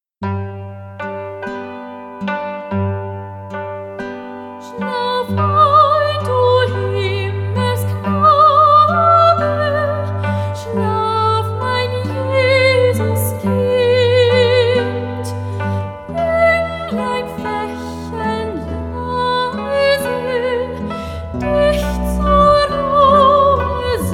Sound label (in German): Mariae Wiegenlied